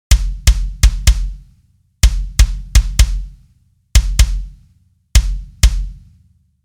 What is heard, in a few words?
Drums kick